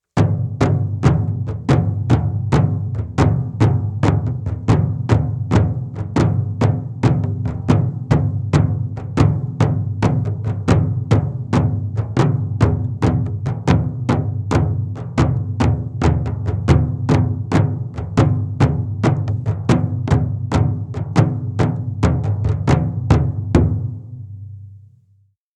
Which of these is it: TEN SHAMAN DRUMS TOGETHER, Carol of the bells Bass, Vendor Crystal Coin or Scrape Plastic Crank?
TEN SHAMAN DRUMS TOGETHER